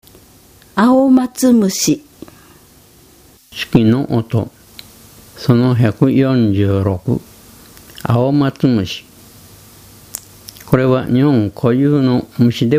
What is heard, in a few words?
aomatsumushi, insect; sikioto